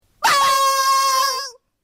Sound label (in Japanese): エアーホーン